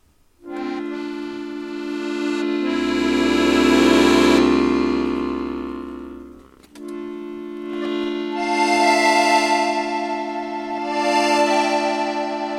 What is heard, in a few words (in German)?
drehleier; krimilesung